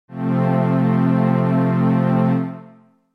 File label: C F Major